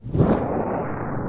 Fire Ring Start; Zelda; OOT

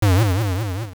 Scent Seed; Use a Scent Seed (also Moosh's ground pound, Digdogger [OOS Boss 5] landing, and more)

